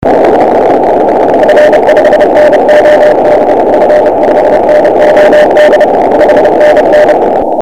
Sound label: 28 cw